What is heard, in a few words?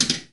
Pinball Slingshot Ball Eject